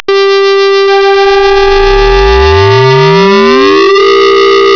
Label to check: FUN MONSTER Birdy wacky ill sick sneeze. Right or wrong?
Wrong